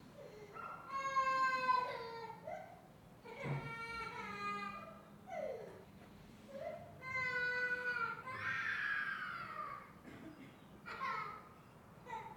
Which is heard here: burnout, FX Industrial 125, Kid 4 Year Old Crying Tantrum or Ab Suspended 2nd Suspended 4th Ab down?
Kid 4 Year Old Crying Tantrum